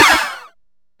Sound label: pit fall, traps hazards; freepixel